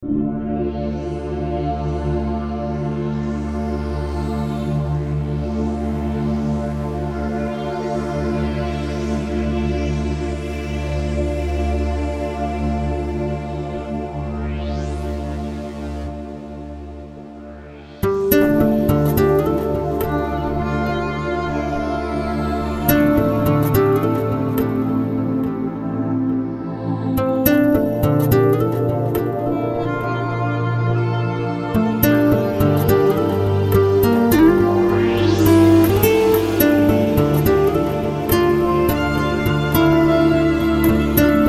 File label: asymmetric acoustic diffusion